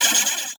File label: Multimedia Internet CD-Rom Flash Wipe